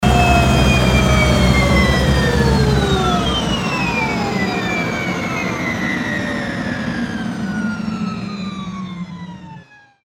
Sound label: heli stop; helicoptervehicle